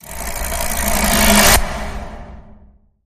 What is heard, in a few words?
Pedal Spin Whoosh